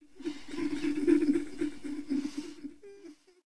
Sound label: cemetery; portlux